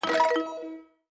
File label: video call in; Dargalon